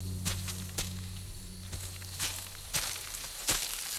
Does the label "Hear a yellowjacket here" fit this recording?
No